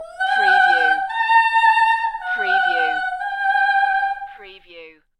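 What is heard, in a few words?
Female Opera La La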